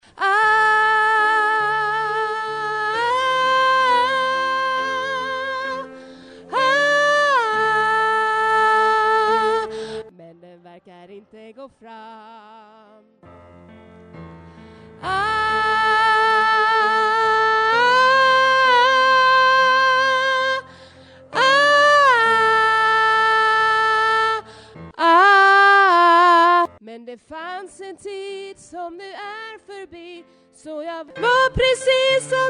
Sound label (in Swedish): bas, stammor